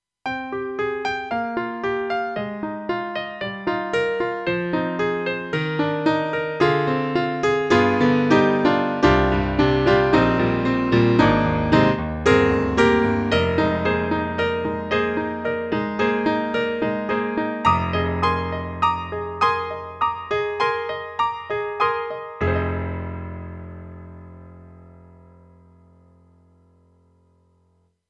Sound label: AKAI SG01p TIGHT GRAND